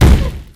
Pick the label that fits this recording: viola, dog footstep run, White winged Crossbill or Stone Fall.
dog footstep run